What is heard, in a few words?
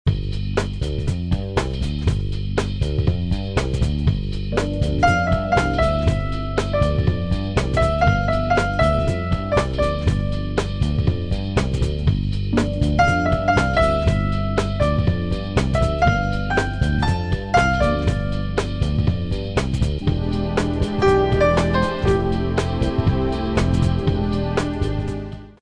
light jazz